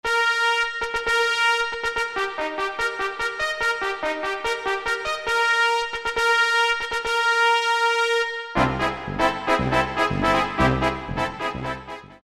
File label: Yamaha TG33; Factory Presets; BR-Fanfr